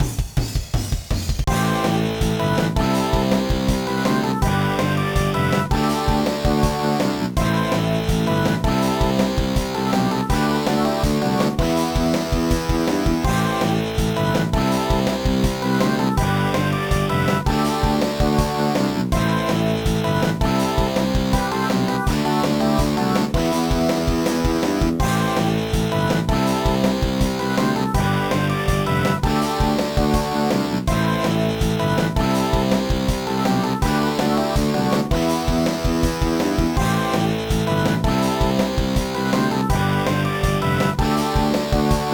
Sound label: BGM; Sabrina the Teenage Witch Potion Commotion; GBA